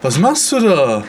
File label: hurt; spackenmobs; apored